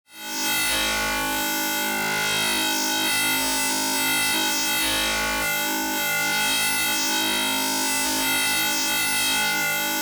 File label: TEXTURE Electric Blue; boom library; Neon